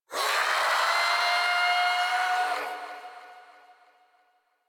Shadow Vocal Idle; Warframe; Duviri

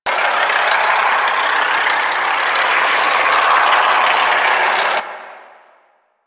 Infested Tumor Loop; Ambience; Props; Warframe; Corpus Infested

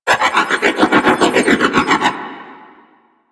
Glaive Loop; Weapons; Warframe; Tenno; Teshin Glaive

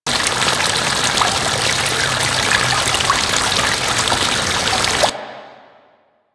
Water Fountain Small B; Ambience; Interior; Warframe; Orokin

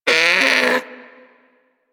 Predator Vocal Pain, Hunting Critters; Warframe

